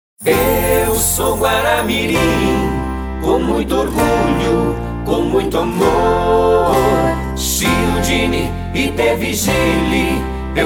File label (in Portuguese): jingle politico; eu sou guaramirim